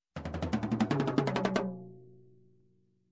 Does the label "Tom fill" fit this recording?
Yes